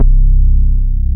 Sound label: BAS MINI, MOOG; Sound & Vision - Gigapack II CD 1